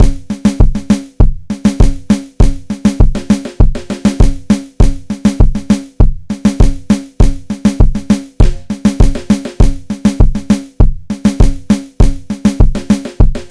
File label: reggaeton